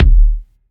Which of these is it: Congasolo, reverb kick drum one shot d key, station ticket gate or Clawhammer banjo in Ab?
reverb kick drum one shot d key